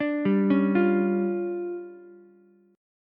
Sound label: D Minor Major 7th Double Flat 5th, D, Ukulele; DmM7bb5; arpeggiated; JGuitar